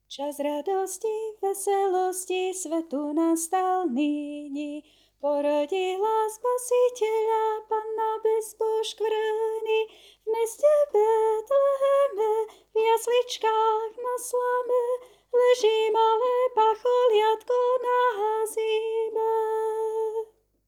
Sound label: Bass